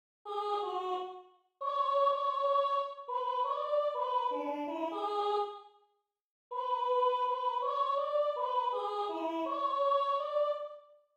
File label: alto